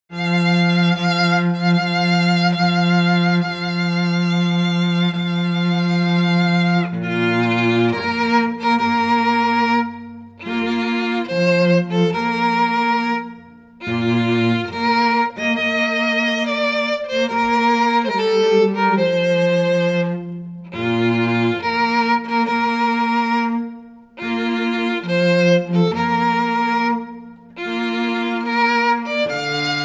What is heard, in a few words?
BRIDAL CHORUS (DUO), string quartet